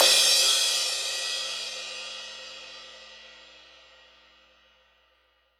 roomy crash sound f key, Royality free crash sound tuned to the F note. Loudest frequency: 5001Hz; Cymbal; drum sample; one shot